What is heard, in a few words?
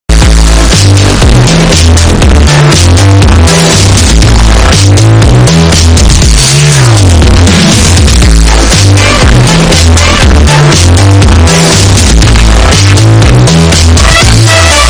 electro swing earrape